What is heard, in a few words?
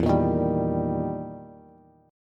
E Minor Major 7th Flat 5th, Bass; 12,13,13,12; strummed; JGuitar